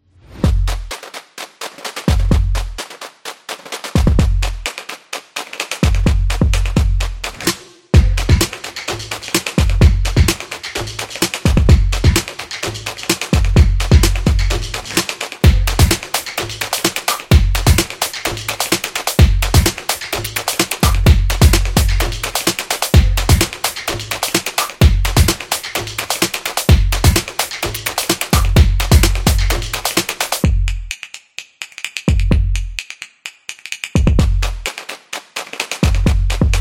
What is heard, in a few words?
Drum Beat Opener